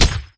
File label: mirror